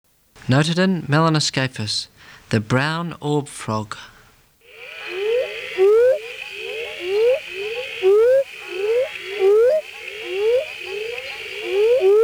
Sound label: Notaden melanoscaphus